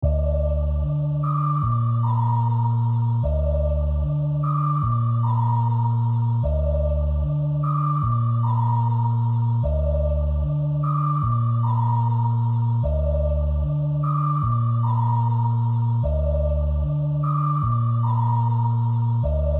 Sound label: Instrumentals